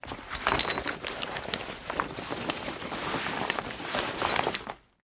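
zamena komplektuyushih na kolesah, replacing parts on wheels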